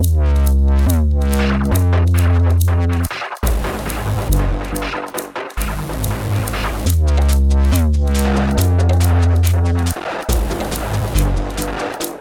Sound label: Dubstep